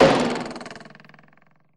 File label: thwacky; Crash Bang Boom